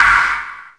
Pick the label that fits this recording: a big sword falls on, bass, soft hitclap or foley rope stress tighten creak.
soft hitclap